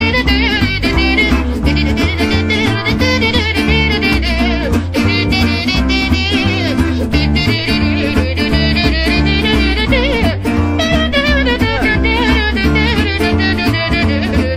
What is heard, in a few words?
amazing french singer ringtone